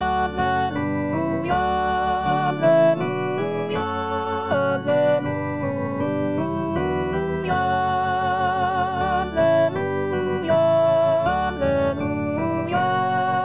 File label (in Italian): Alleluia Contralti